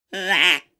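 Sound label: quack, smeshnye multyashnye zvuki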